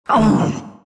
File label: broo pain; broodmother